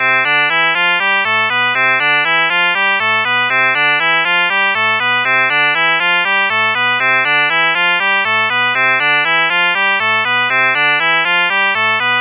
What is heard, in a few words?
harmonic tone, shepard tone